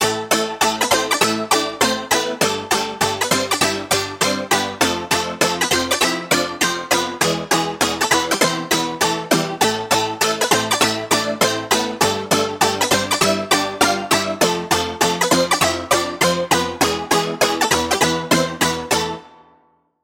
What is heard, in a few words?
Key; Toad; Routed Sound; Spire Expansion - Hyper Gamer Vol.1